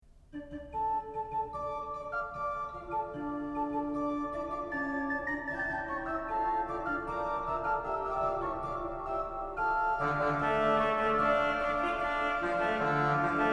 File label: Trompette Cornet, pipe organs; Marchand